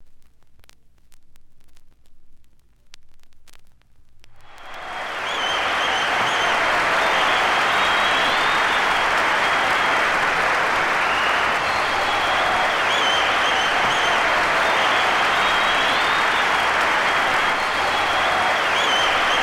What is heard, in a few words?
Large Crowd Applause